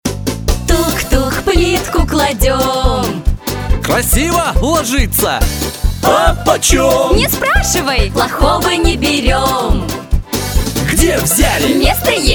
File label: voc rus